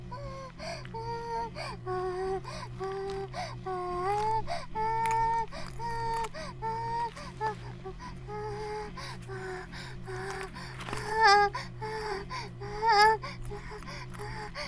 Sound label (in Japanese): バイブ放置, ASMR